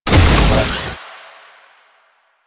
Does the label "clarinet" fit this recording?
No